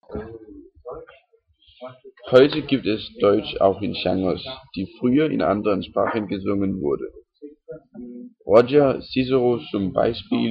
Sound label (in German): pop auf deutsch